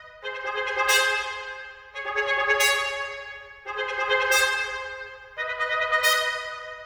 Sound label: trumpet, напряжение